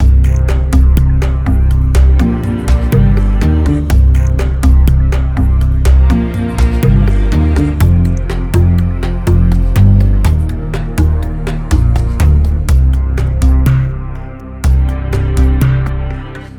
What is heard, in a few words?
sounds music; Halo Combat Evolved; Xbox